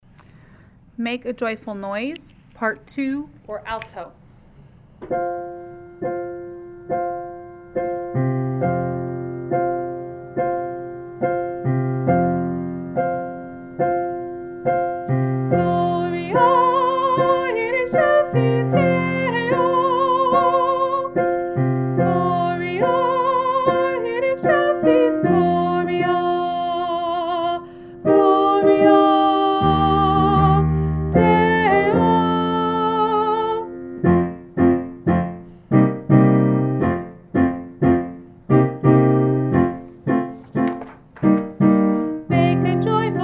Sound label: Make a Joyful Noise Alto